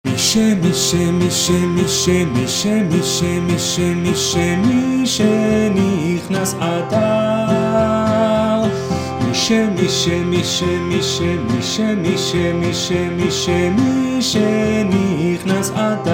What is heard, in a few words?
voice; Mi Shenichnass Adar; BCC choir